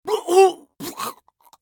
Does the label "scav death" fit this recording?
Yes